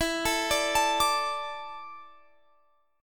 E 7th Suspended 4th; E7sus4; arpeggiated; x,19,19,19,22,22

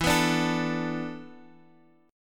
B Minor 7th E, chord; Bm7/E; strummed; JGuitar

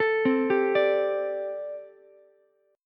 A Minor 11th A Ukulele C6, Chord; Am11 arpeggiated; JGuitar

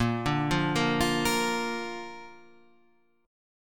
Bb Major, Guitar, Standard; arpeggiated; JGuitar

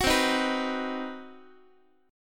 C Minor Major 7th Flat 5th chord, Guitar; strummed; JGuitar

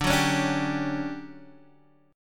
D Major 11th Guitar Standard, chord; strummed; JGuitar

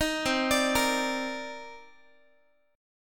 C Minor 9th C Guitar; Cm9; arpeggiated

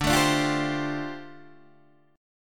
D Minor 11th D down Guitar DADGAD

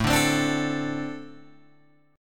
A-Minor Double Flat 5th A Guitar Open G

